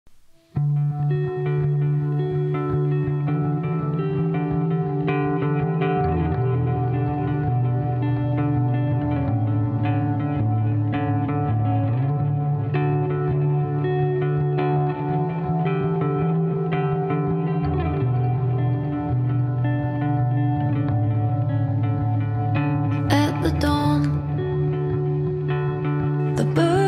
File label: acoustic